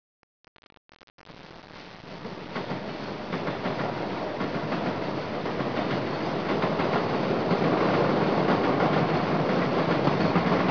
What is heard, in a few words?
trains; denisok